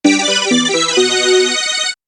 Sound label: Got Puzzle Piece!; Touch the Mappy; Android